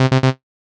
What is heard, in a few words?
Error Sound